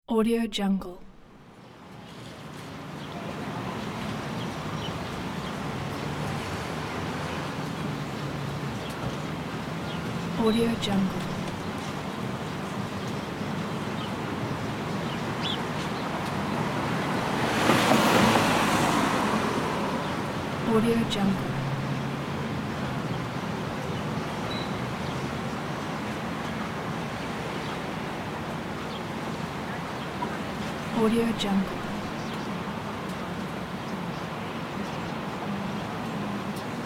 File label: city street ambience